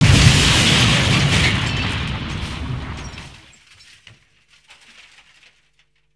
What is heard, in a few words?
car explodes; dangerous racing